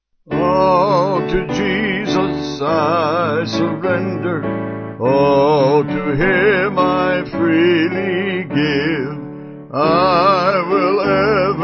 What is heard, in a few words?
Vocal Piano